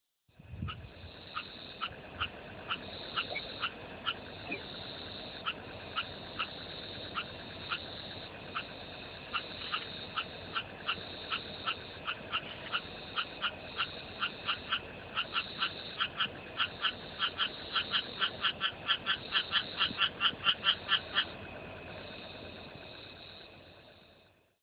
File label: frogs in tambo queensland